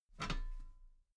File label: metal ladder step soft muted